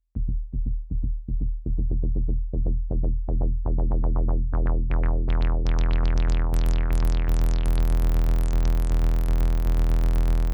Bass Synth Filter Crank erster und zweiter Overdrive Algorhythmus; Analog Four MKII